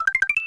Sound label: No Up; SMM; SMW